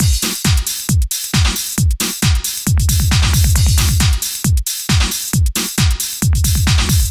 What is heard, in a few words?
drum; widdagroovebox